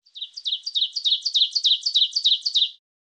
Bird, North American Forest; Song Bird Singing Clos; Song Bird Singing Close Perspective.